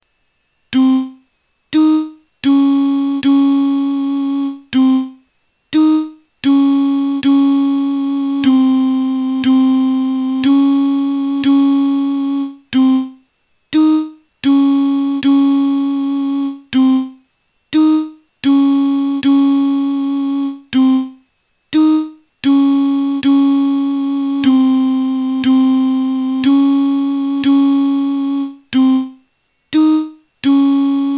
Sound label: chorus alto